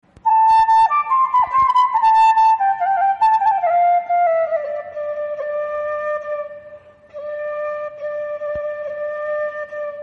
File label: Instrumental Song Ringtone